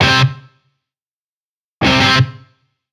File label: Cutted Triplet